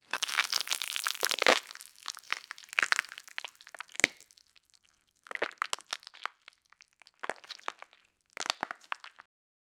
a sound effect sequence, cake

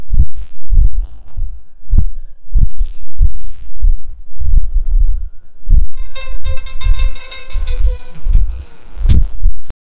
same time bouncing, object

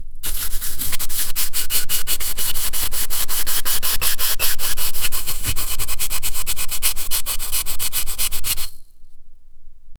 paint very slowly brush

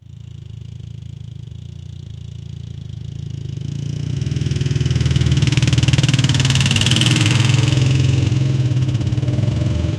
the sound of a motorcycle, wheels spinning

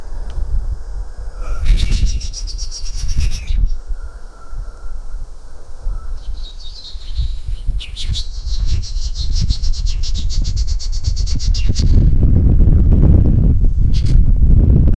sound of wind with whispe; atmosphere

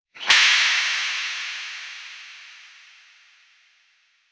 a soft satisfying shick, firearms

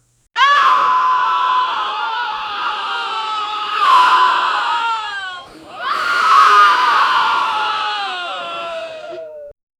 a sharp sudden chorus of, reactions

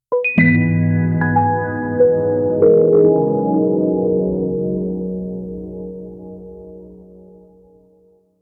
slow rising electron; synth

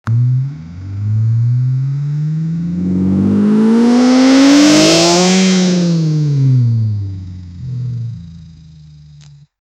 realistic high quality, supercar

